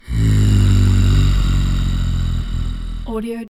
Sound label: screams shouts monster male clean